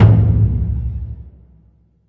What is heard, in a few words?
big burundi, African Burundi Drums